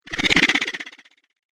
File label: Vibrating Creature Whoosh; fantasy sound effect; Whoosh Sound Effects